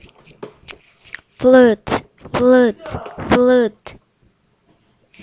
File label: flute